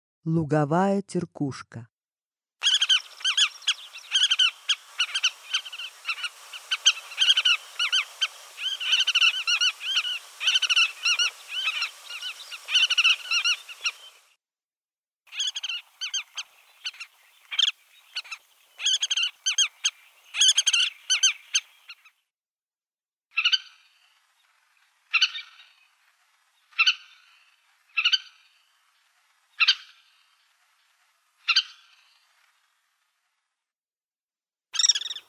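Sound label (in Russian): lugovaya tirkushka golos, collared pratincole voice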